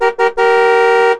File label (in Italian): Clackson, motori